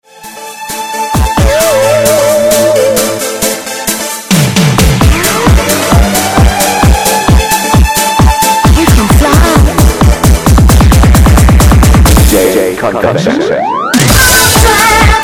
dance

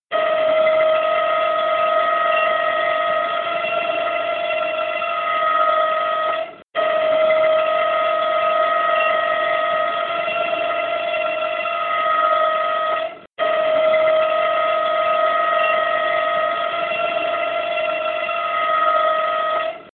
train whistle alstom

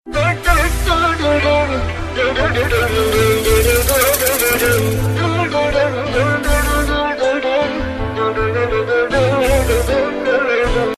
ringtone vocal

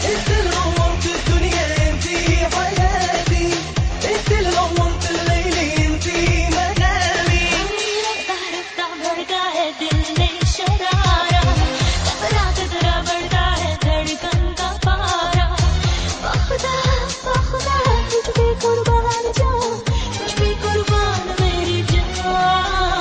Arabic

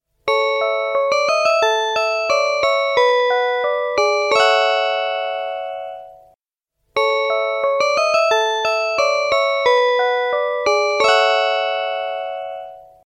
atmospheric tune

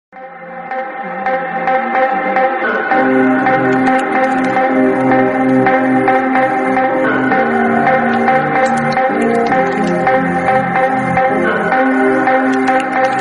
instrumental

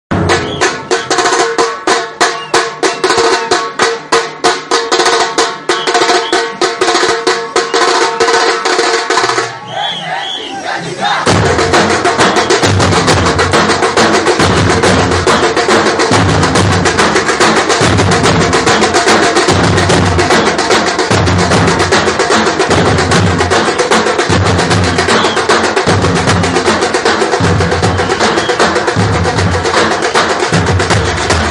Tamil drums

